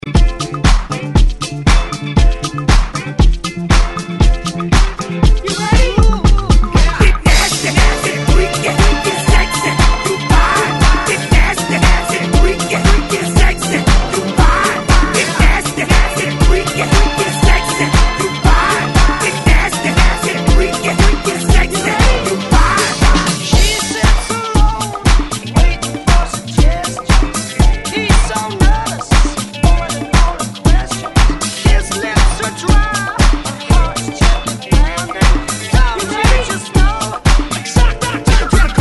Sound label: HYPE REMIX BLEND